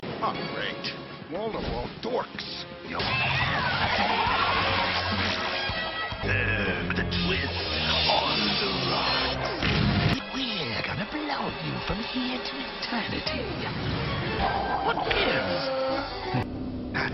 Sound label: whirlwind